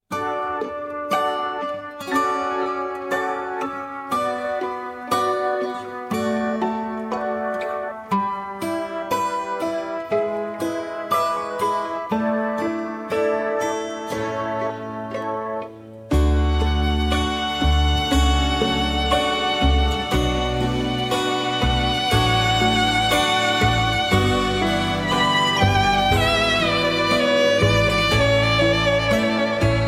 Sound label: Air Acoustic Version